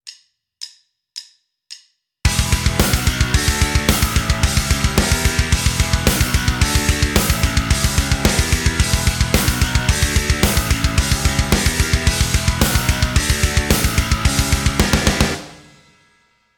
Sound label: backing; Rockschool; Guitar Techniques 2019 Issue 297 July